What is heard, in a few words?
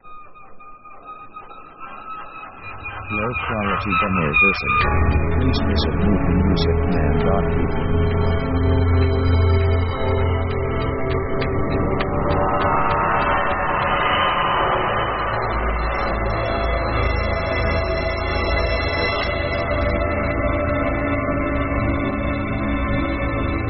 protoplasm, synth; synth themes